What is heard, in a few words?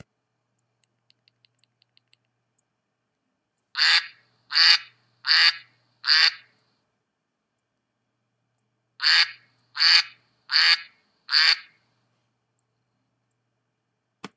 ring tones